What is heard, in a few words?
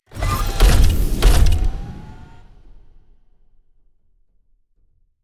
Mecha Move Start; PCBS; Aorus